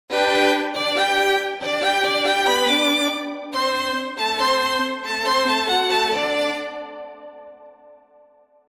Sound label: Ein Kleine Nachtmusik 140 bpm